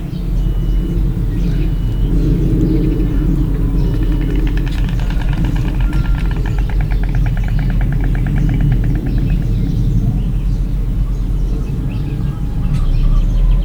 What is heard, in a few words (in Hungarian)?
jobbról és messziről kelepel repülő, fehér gólya; termeszetben